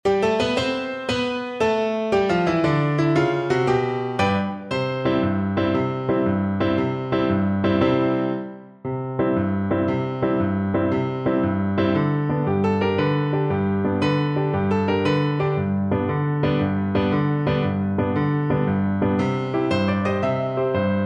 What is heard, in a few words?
Recorder